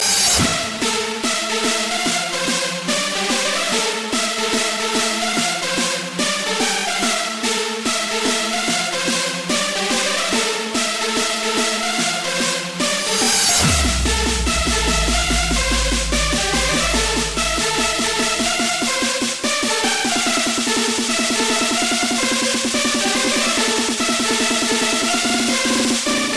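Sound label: Hardstyle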